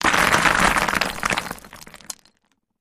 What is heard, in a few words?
Rock Cinder Block Deb, rocks & dirt